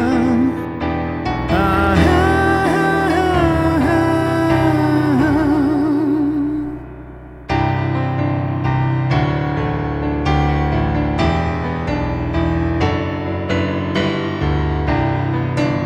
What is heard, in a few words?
Smaller Acoustic; Acoustic clip